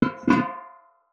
Keg 20 Litre Pin; Glitchedtones

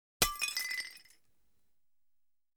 Destruction, Plate; BBC Sound Effects Library

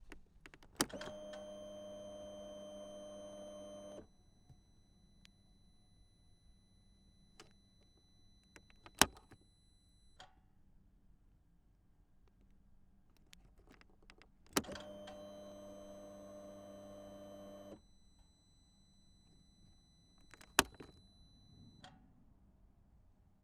Polaris Scrambler, Ignition Key; 1000XP 2018; MS Decoded

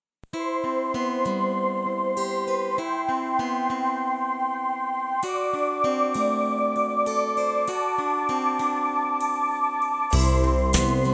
Ballad

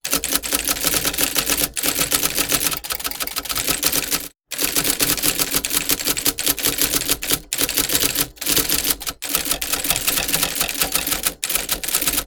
Typewriter letter key fast random; akg; SkewSound; QWERTY

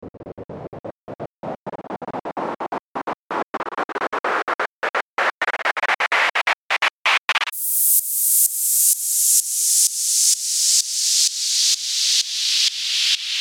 White Noise

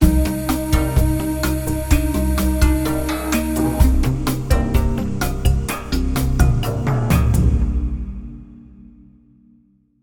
Signature Sound; FXHome; Signature Tracks

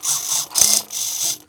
servo movement; Sound Design Tools; Raw Foley

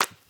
put magazine on table

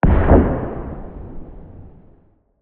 Distant Blast; Lukas Tvrdon

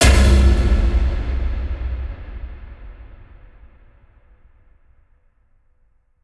Cajon ish, Cinematic Impacts; 99Sounds; Rumore

